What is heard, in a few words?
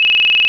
Ping Ring; Microsoft Lumia-550